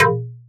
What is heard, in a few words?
metallic industrial percussion hit